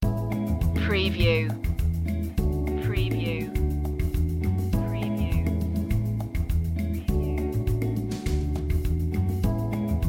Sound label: happy rhythm underscore